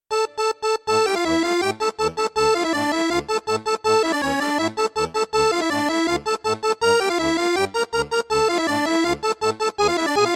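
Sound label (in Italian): Fisarmonica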